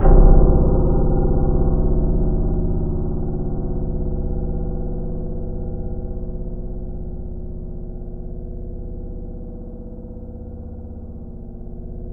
C1, Synth Line

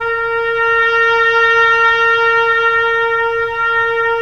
OB, Oboe; Roland - LA Composer vol. 4

